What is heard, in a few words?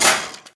metal pressure plate; Minecraft; Votive World